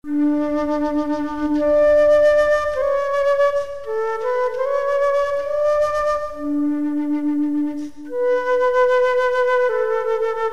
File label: Solo Flauta